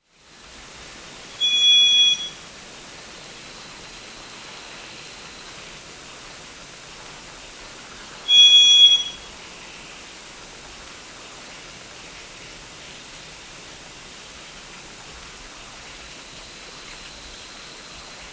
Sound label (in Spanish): Solitario Orejiblanco Entomodestes leucotis